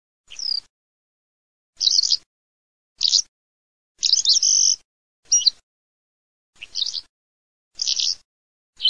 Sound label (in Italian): calandra